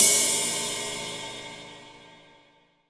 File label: 18 OLD, 18INCH OLD ZIL RIDE